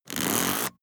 plastic mechanical wheel rattle

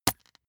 bath duck hit hard

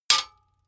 sword metal hit ring

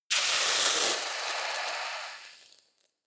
volcano oneshot lava sizzle

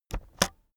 keyboard external space press slow

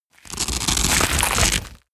tear

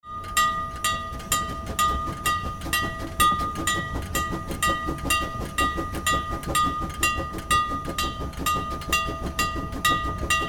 old train bell and steam

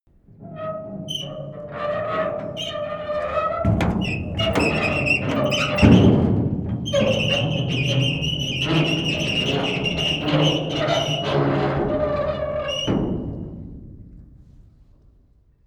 metal gate open long

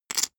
poker chip stack small rattle short wobble

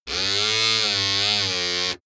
servo

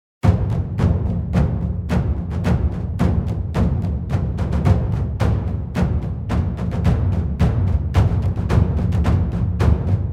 grooving taiko drums

